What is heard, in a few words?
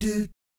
DOOT G#3, Jazz; ILIO; Vocal Planet; Jazz & FX; JAZZCOMBO